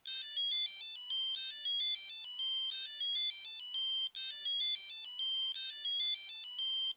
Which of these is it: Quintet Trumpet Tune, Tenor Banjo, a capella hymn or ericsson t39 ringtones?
ericsson t39 ringtones